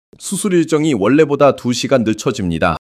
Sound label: surprise